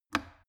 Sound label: plastic water boiler turn switch on